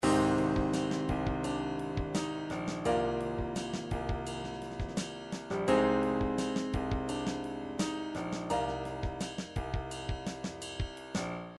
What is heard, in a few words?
triphop, midi; Newtronic Triphop Construction Kit